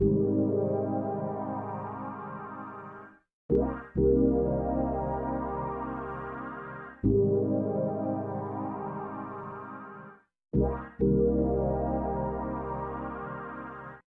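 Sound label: synth 68 C; ProSamples vol.34 - Hip Hop & RnB 3; Slow Wined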